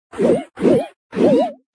Sword Mini PEKKA

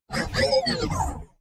aggressive beeps; 堡垒（守望先锋2）

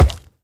piglin brute step; Minecraft; 猪灵蛮兵（我的世界）; 猪灵蛮兵是守卫堡垒遗迹的攻击型猪灵变种。

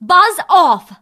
hurt

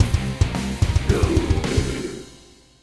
loading jingle; lumi

